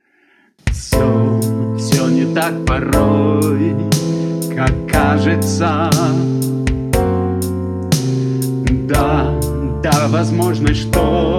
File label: Instr Voc